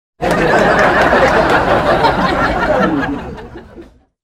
Crowd Laugh; Soundzabound Volume 3

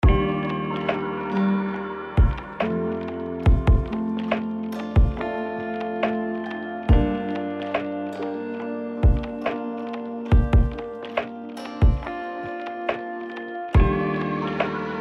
Rhodes

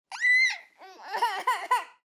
Baby Cry

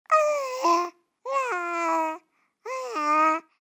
Baby Babble

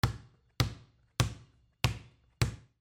ball bounce multiple

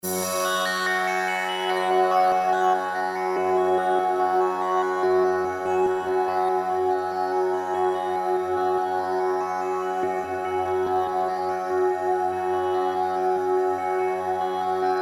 Arpeggio

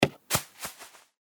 ball drop in grass

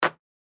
basketball swoosh

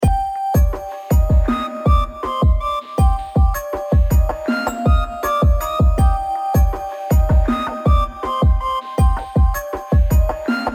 Vibes, Full with No Vocal; Los Angeles

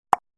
pop; Soundzabound Volume 3 Disc 3

